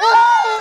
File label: female orgasm